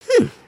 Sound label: bored hmmph; emotes